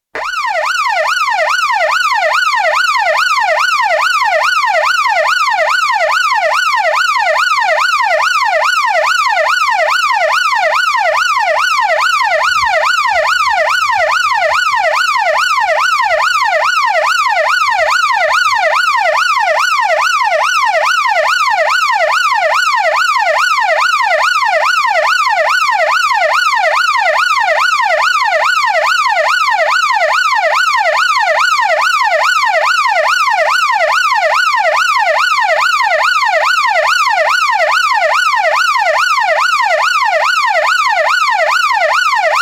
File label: Police car yelp siren, exterior acoustic; Emergency Vehicles; BBC